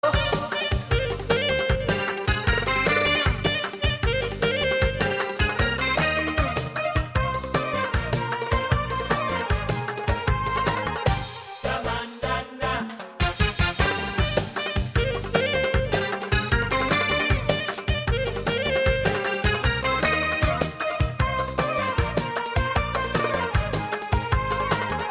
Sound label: Sax Music